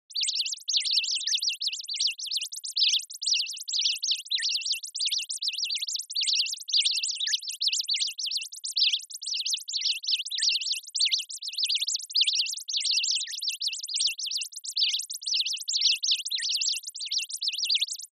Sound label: Onoma Panic High